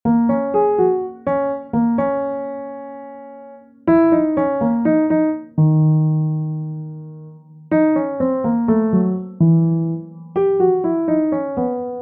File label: bossa nova